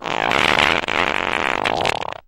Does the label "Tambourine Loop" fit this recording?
No